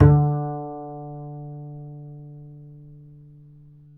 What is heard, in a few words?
D 4, Bass; Spectrasonics; Bass Legends